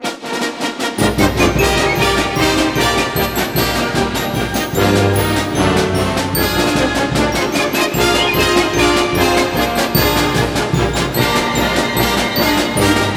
Broadcast Outro

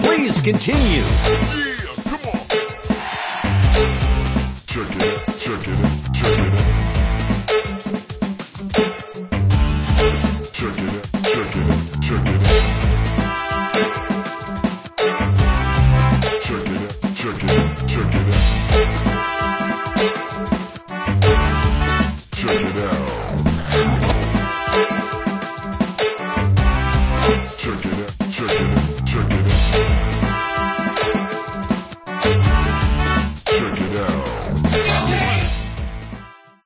Continue Theme (w SFX); nba play by play arcade

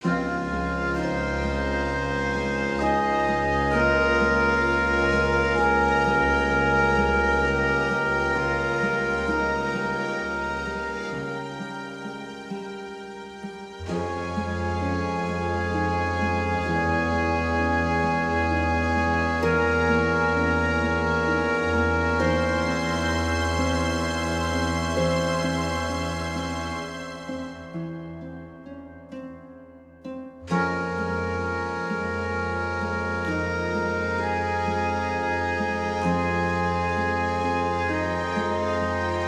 Exploration Music; the lord of the rings return to moria

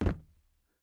plastic land; teardown; ps5 windows xbox series xs

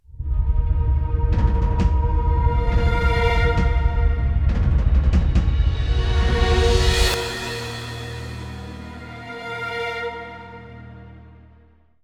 Dark Loop

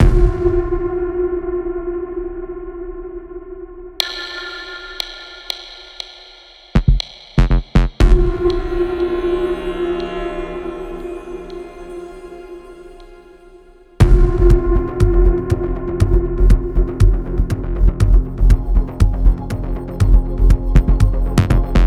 Timer

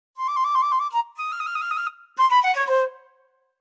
Dialogue Box Black Bird (Dizi)

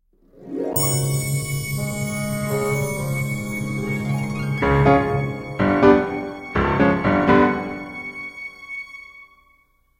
Success Stinger

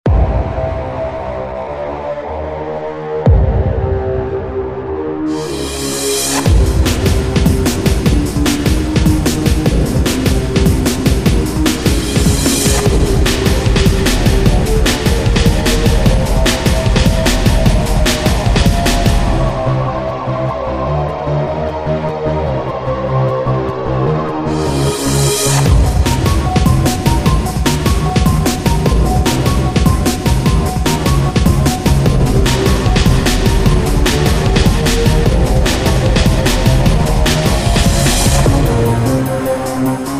Action

